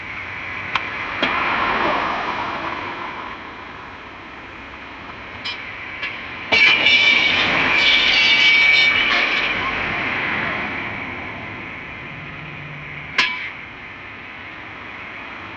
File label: braking car and then big, collisions